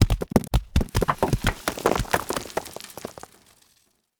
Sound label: combat atmosphere; World of Tanks